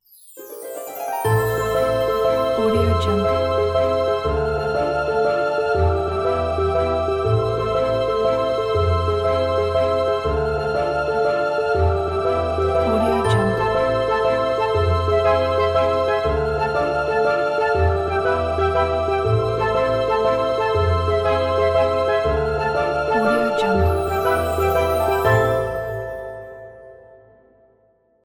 fairy slow waltz ident

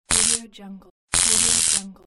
stun gun

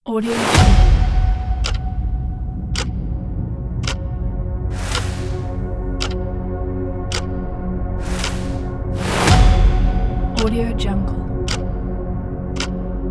powerful and intense

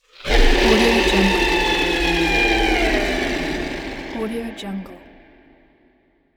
demonic scream

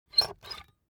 object office puncher squeak punch; smartsoundfx

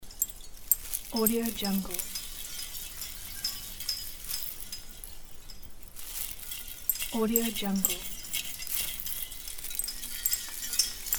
christmas tree ornaments shaking; fxprosound

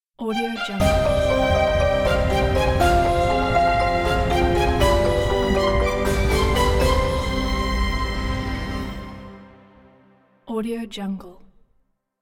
dark melancholic stinger; ruinmusic

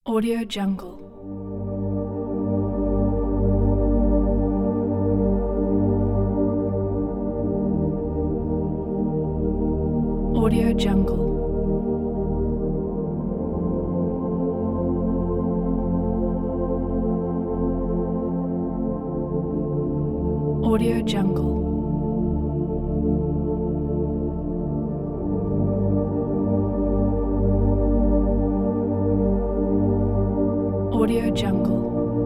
meditation background pack; impulssound

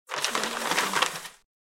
paper wrinkling

